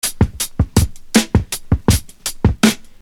Beat, Hip-Hop Street Beats

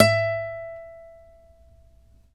ACC FG E, Acoustic Guitar; East West; Steve Stevens Guitar Samples Collection

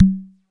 DARABOUKA; Music Store Sound Service; Einstein

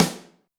snare, Zoom MRT-3B

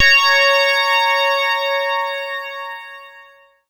C#, BELL GONG, Synclav FM; Big Fish Audio

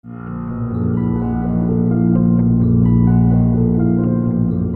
String; Sonic Foundry (Sony Creative Software); Infinite Soul VOL-1

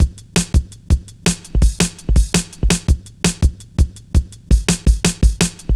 reece steppa, drum loops; Zero-G Jungle Warfare 1, 2 & 3

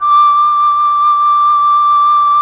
FLUT; ILIO; Propeller Island Legendary M400

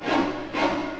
Orchestral Loops; 128 PSYCHO

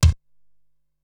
Electric Kick, Ensoniq SQ-R Plus

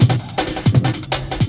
Beat, Industrial

